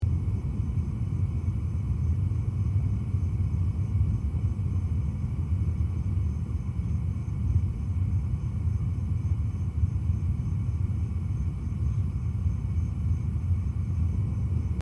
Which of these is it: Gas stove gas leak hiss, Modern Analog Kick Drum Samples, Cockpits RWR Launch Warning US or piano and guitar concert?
Gas stove gas leak hiss